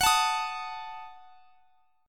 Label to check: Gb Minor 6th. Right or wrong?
Right